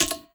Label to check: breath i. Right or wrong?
Wrong